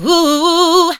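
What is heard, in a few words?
woo woo ah, female vocals, right; PlatinumPhattCD2; E-MU Producer Series Vol. 8 – Platinum Phatt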